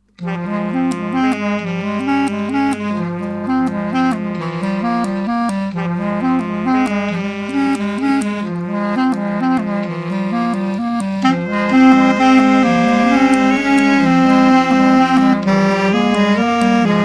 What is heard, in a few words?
Reed Duet